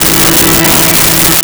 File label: Pipe Organ E4; Nintendo; July 2020 Gigaleak